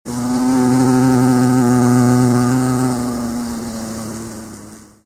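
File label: hachi nak, bee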